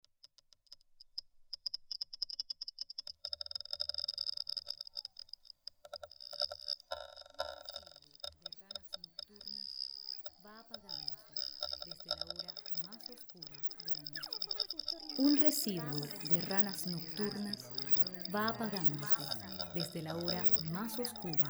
soundscape with amphibian vocalizations of physalaemus fischeri